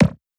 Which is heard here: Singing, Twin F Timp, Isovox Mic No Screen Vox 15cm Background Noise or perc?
perc